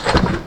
Press Machine Electric Hum; loop mono; MACHINES & MECHANICS; Universal Sound FX

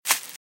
Velociraptor - Footstep - Grass - Run - Right

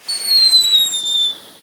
firework single; best service Blue Box

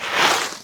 Ski Turn On Ice; Misc Sports

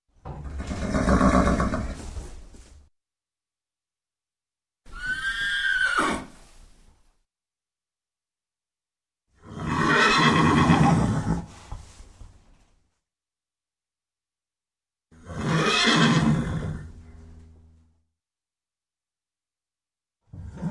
Horse Grunt and Horse Neigh; Sound Ideas; Series 2000

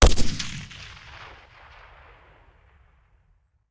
Machine Gun; Sound Ideas; Guns

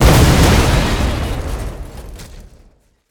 Explo Metal Cannon Destroyed; Destruction

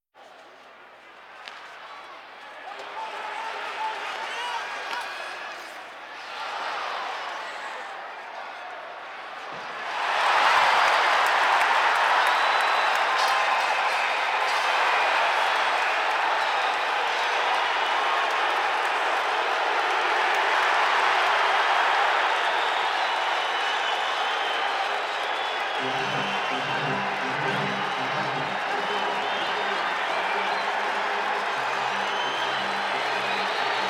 Hockey Goal; KMP Film Video Sound Effects

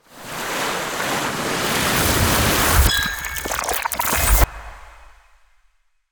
Ice Spell Summoning Call Forth Minions; Arctic Aura

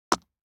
DICE on Neoprene, Drop, Standard, 3 Three Dice; Samples; ArticulatedSounds; DICE Sfx Party (Extended)

